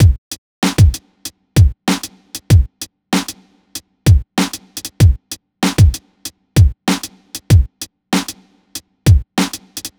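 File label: Drums, Hip Hop Exotica, 096 A minor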